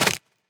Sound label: mouseclick; freespin end table; Wild Guns